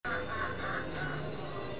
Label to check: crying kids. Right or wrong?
Right